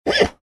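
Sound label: sound made by a foal; loshadi i konya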